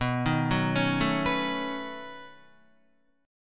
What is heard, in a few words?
B 9th B down Guitar; B9 arpeggiated; JGuitar